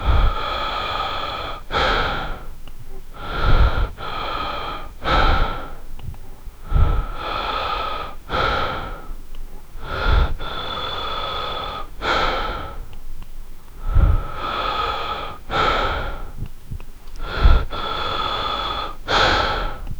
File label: a man breathing with an